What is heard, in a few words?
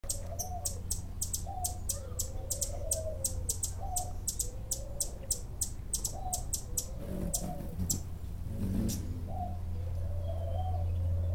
Hummer warning Dove in bg